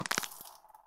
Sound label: Blood spit